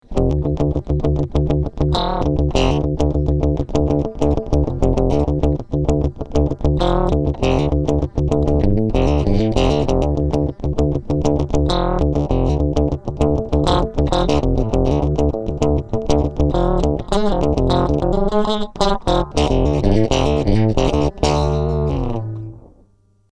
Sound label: RingMod